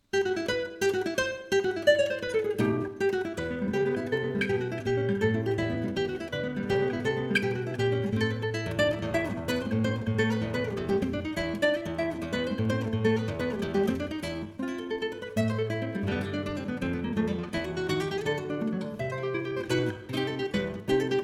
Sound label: tarantella